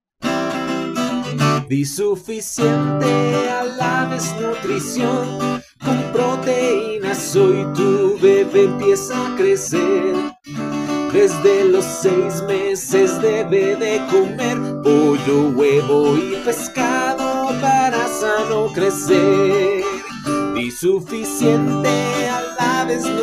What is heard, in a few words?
Jingle